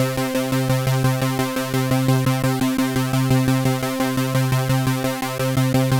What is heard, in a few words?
Korg MonoPoly, synths; OP-Z